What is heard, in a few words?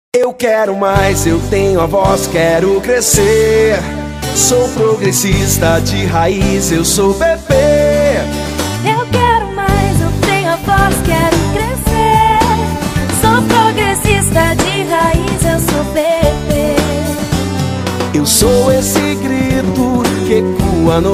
Jingle